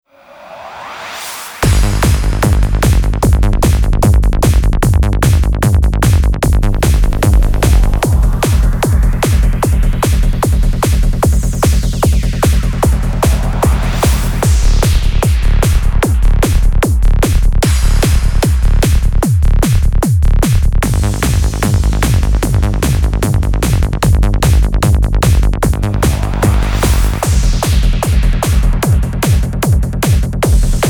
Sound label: Kick 150bpm